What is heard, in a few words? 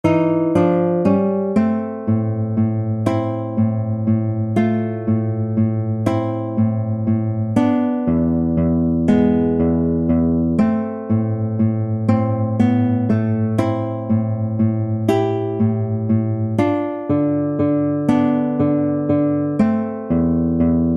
prelude 2 duet